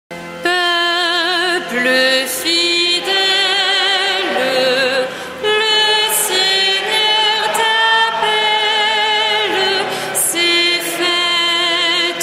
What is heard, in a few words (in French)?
procession; procession de l'espace Bernadette à Saint-Étienne